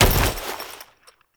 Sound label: Foley Weapon Fire; Warframe; Kahl